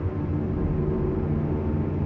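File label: Ambient Machine Humming Slight; Defiance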